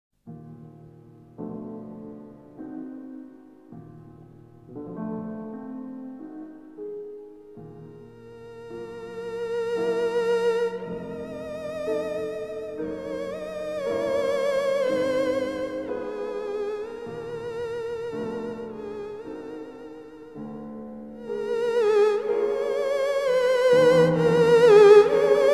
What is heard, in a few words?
muu sii theremin